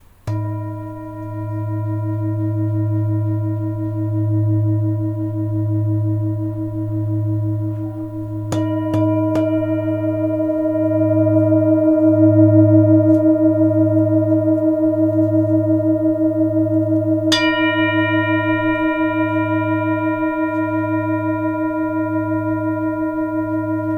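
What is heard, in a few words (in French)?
bengal SOL DIEZE, bols chantants tibétains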